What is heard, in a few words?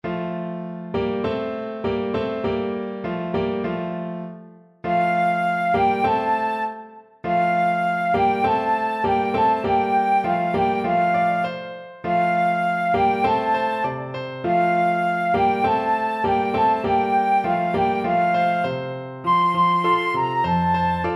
flute